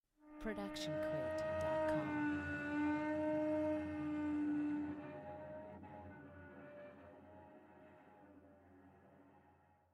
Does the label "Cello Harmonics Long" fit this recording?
Yes